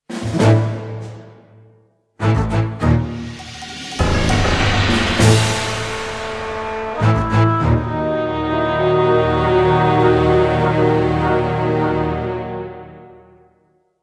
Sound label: Music Game Ominous